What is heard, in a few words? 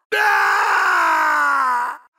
fall scream; mk9; raiden